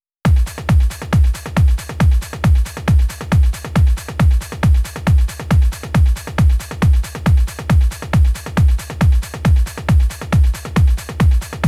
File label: Vocal Club Mix